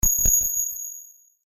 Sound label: UI Rollover Major, User interface feedback sounds; Computers; Electronics